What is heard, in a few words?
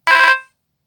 Notify